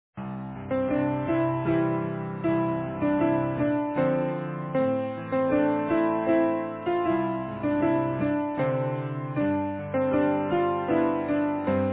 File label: Easy Piano